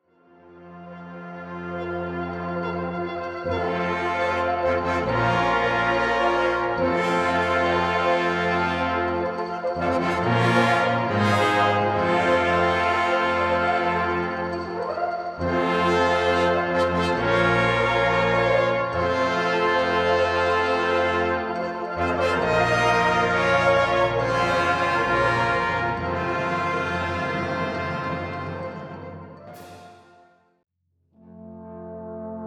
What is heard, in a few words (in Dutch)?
Fanfare; Fanfare Toeterdonk; Engelenburcht